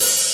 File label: CHH, Dance Drums